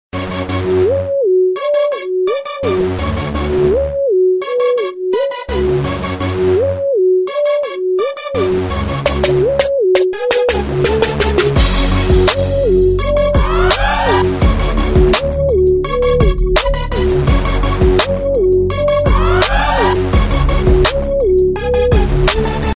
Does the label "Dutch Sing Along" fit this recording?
No